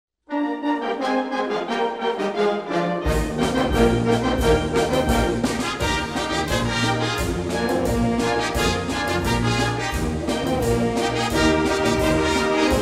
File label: Mexican trumpets; bandas